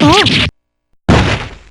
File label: damage; p4u; elizabeth